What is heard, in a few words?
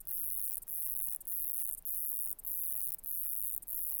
song, katydids; neduba; cascadia